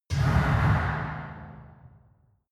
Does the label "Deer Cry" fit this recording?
No